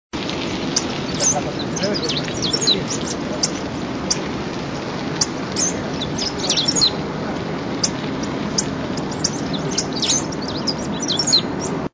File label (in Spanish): verdón, voz